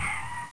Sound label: skid; madcars2; radicalplay